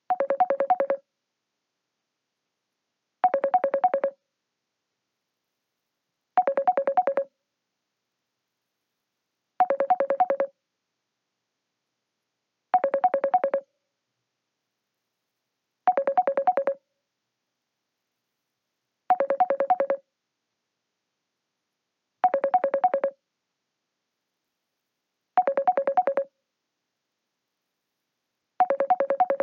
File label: incoming call